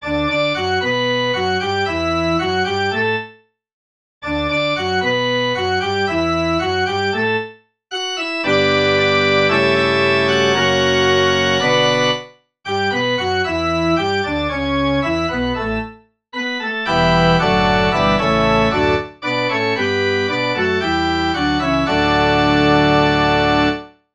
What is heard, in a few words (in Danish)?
forspil; menneske din egen magt